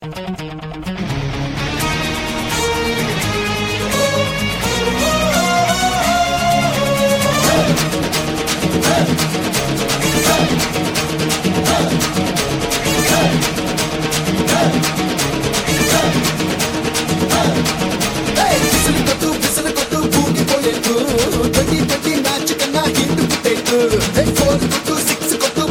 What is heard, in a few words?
telugu ringtone